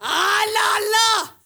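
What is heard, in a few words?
FEMALE RAVE; Spectrasonics; Heart of Africa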